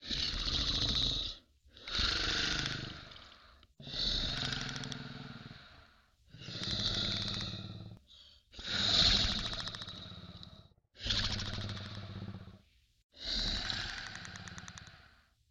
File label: dust roach sleep loop; Hollow Knight: Silksong